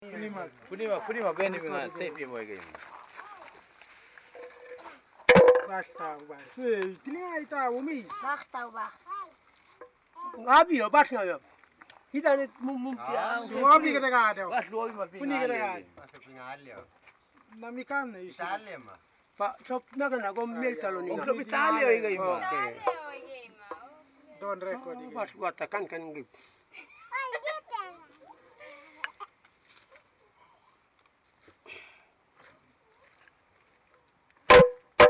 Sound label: island village canoe drum